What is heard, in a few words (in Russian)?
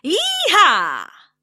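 звуки дикого запада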